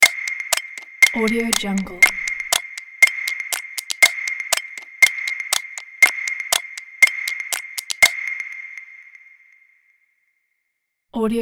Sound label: countdown ident